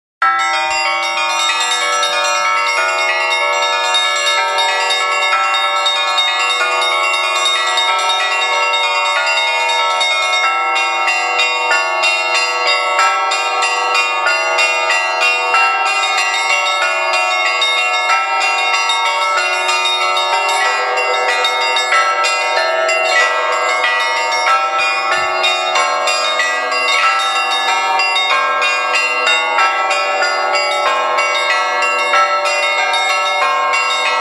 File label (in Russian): zvon